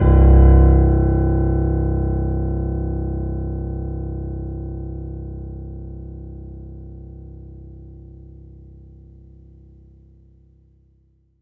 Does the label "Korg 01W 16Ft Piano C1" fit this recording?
Yes